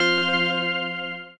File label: autoplay off